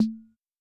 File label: Conga Lo; Gulbransen; Drums